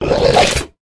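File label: lizard attack middle; yasher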